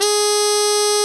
Harmon Mute C3

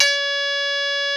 Koto D3

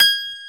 Piano Gb4

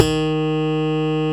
Slap Bass F1

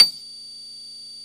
Piano C6

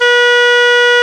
English Horn C#3

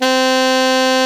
Sax E2

